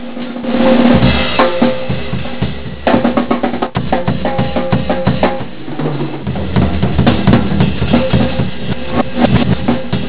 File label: drum